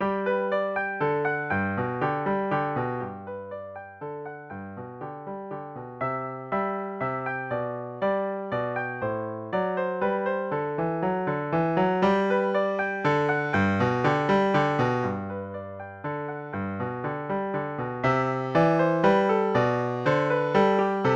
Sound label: piano